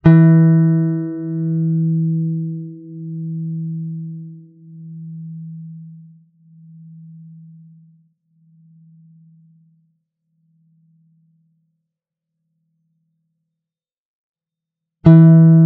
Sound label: wolf tone plucks